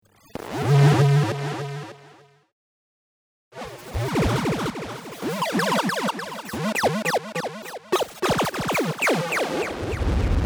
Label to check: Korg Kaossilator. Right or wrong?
Right